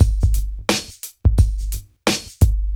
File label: muted, Drum loops, Electro